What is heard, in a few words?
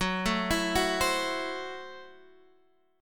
F sharp Minor 7th Flat 5th, Guitar Drop D; chordshape; down